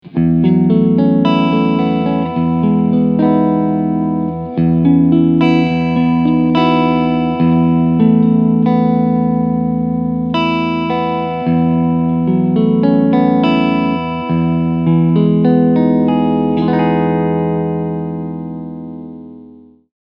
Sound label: Clean Front HB SC Middle SC